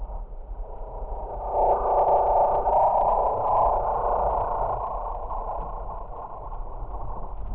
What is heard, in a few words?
moan; Quake; PainKeep